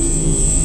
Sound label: repair use; Tribes2